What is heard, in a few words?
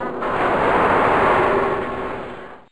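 torpedo; U-Boat 1.5.3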